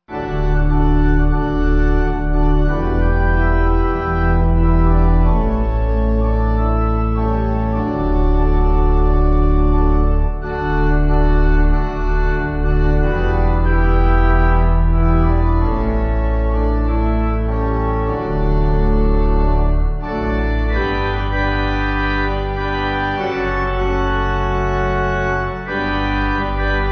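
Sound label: Pipe Organ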